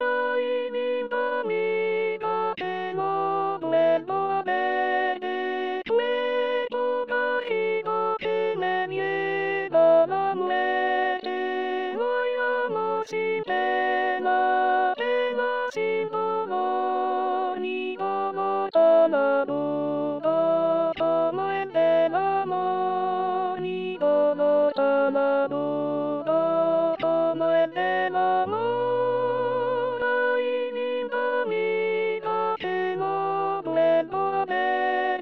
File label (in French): Alto, choristes; Ay Linda amiga